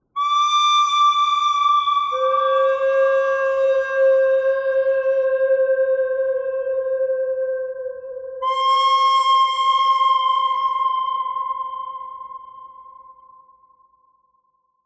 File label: bird thread buzz